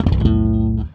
Slap One Shot A, Bass; Bass X

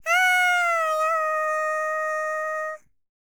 HIMALAYAN, World Voices; ILIO; Vocal Planet VOL-5

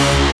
SYN Teckord; Best Service Gigapack 1

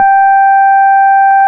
RHODES; Best Service Advanced Media Trax Modern Composer

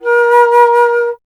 Alto Flute

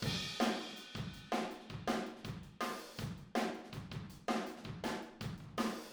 Take Your Toms Off - Verse - Room, Drums; Sonic Foundry (Sony Creative Software); Drums from the Big Room The Mixes; Demo Projects

